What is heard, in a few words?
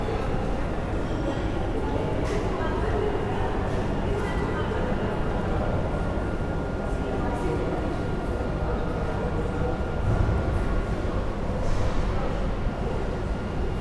Urban airport interior generic; urban and suburban; boom library